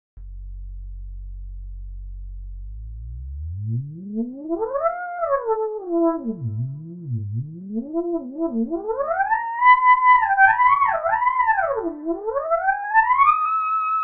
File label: trumpet glissando